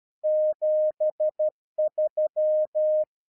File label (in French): 73 son, Morse